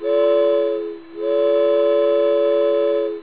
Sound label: train toot